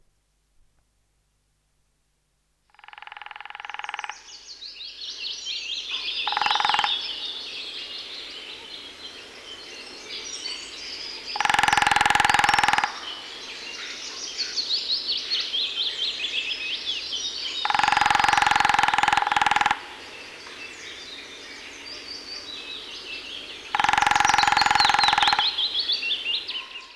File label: Lesser Spotted Woodpecker drum